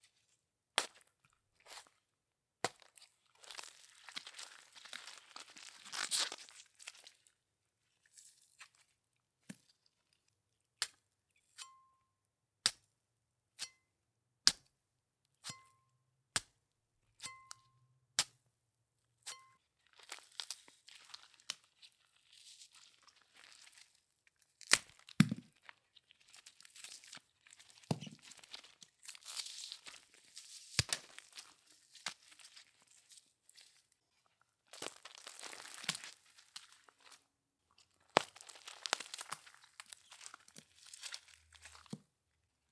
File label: Tortured Apple